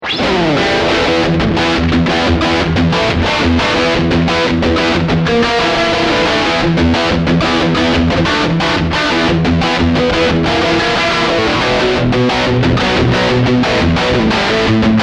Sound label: US Heavy